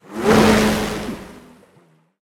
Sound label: buildup; Smite; Zhong Kui